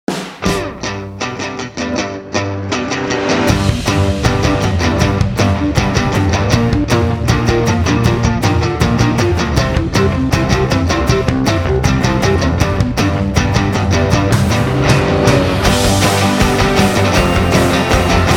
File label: Indie Garage Rocker